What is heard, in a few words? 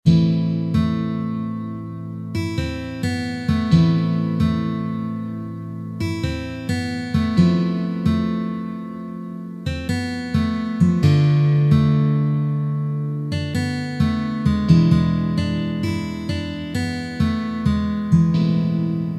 Intro Slow Tempo